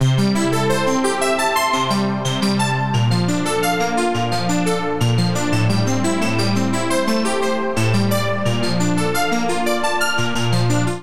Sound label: Arp F Major 87 bpm; Accompaniment; Tonal; MIDI Clips; Past; Drive and Glow; Ableton; Factory Packs